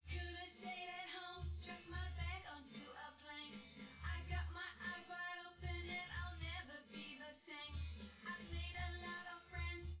wdrums synthconv

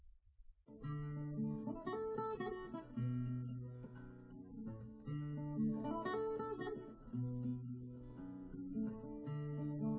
nodrums liverec

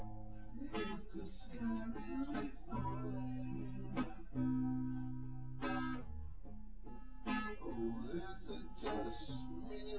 no drums synth conv 250ms 5cm sim; SiSEC08; SiSEC underdetermined

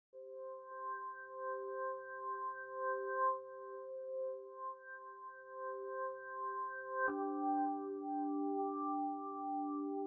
nodrums synth conv 250ms

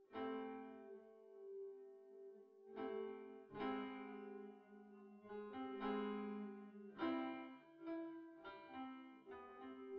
nodrums synthconv

